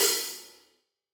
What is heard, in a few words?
Hihat Open